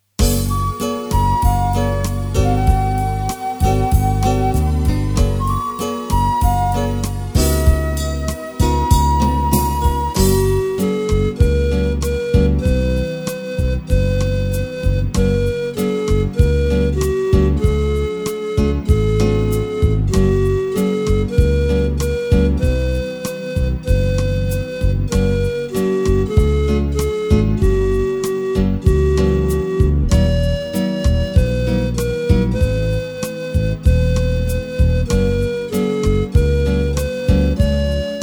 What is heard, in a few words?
Folksong slow with melody